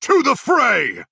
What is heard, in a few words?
attack; shornhelm champion